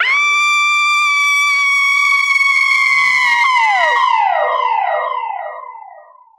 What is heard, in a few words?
scream long